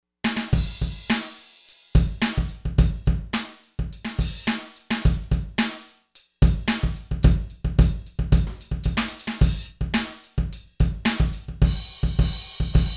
Garage Kit

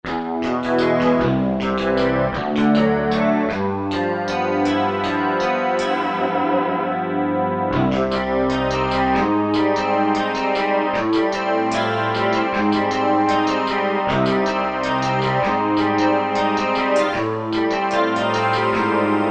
Rock Guitar, Korg M1; Factory preset examples

